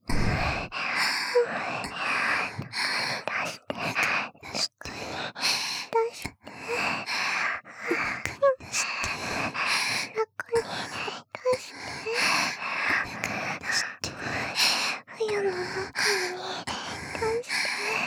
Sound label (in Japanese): 中に出して 喘ぎ